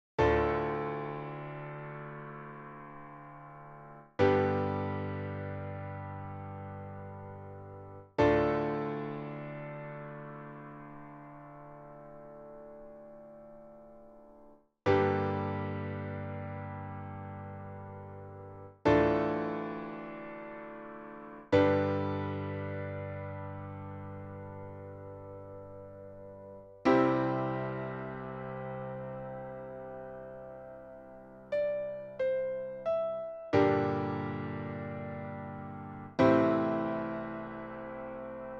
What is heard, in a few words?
Melody Writing Exercise